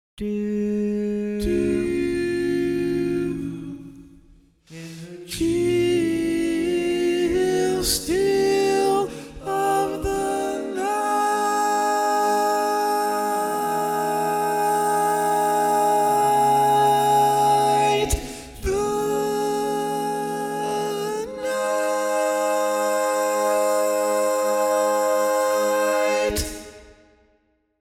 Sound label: Tenor